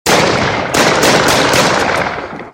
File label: Pistol Fire Until Empty